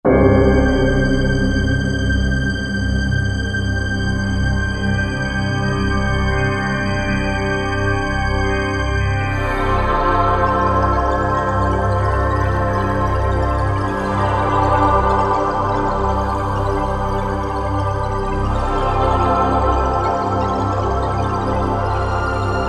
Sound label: Korg M1; Factory demo songs; Out There